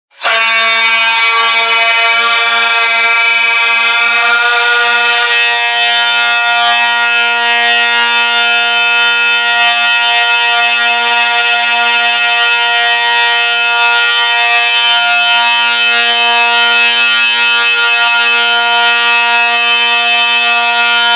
Drone Pipe; RingTones

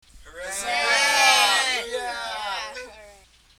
hurray

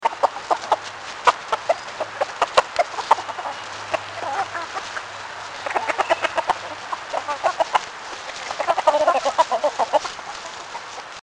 chickens demanding food

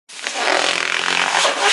FRRRTT; RingTones

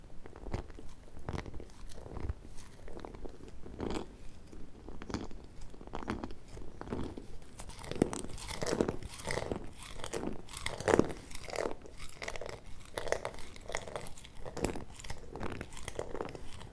grinding pistachios

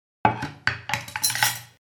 wooden cutboard on wooden table with some cutlery

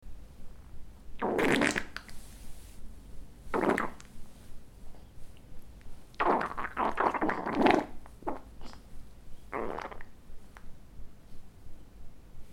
wet fart

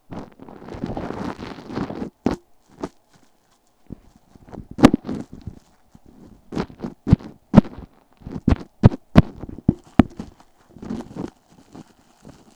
plastic crunch

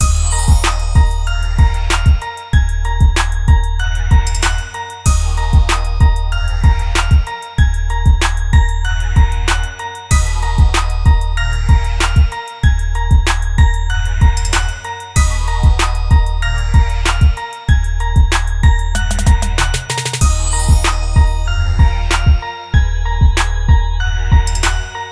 Bell Crunk beat

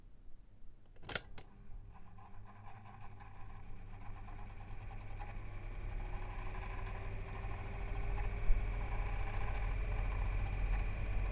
table ventilator

